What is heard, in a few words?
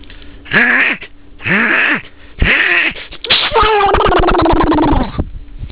achoo